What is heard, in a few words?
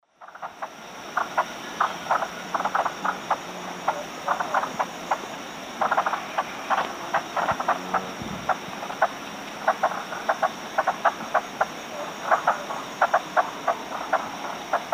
Cyphorhinus arada, group calls; S. America; Eastern Lowlands